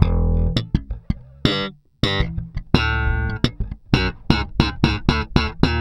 Slap, Bass; Bass X

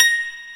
YANG-CH'IN; AKAI S6000 CD-ROM - Volume 1

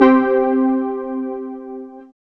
SYN, analog

